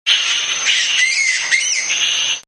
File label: BATS; Partners In Rhyme